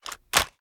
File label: reload drum start; viper